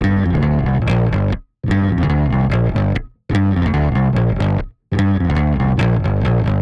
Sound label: Garage Fuzz-Bass Gmin; 145; Dance DJ; Digidesign; Pro Tools; Pro Tools Essential Loops